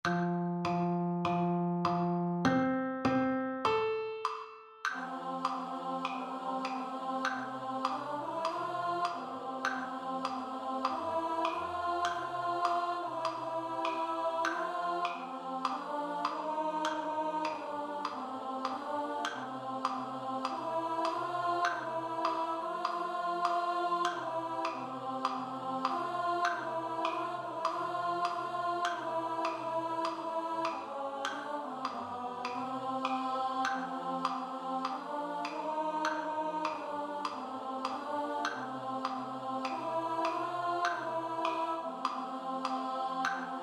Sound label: ALTO STUDY